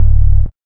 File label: BASS